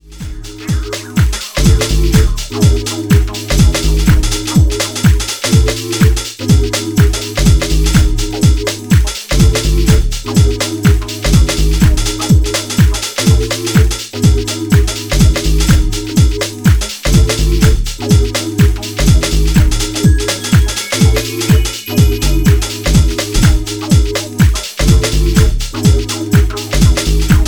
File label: Instr